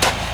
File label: digging; Treasure Island